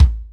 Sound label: bass, drums; SimpleDrums